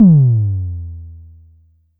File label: Drum X Tom, Pearl